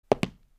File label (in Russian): Шаги по дереву